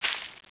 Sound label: metal, player; svencoop; cracklife